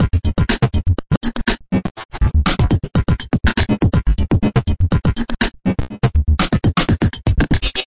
122 bpm, robot doing the robot, drum loops and breaks